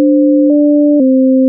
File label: three Notes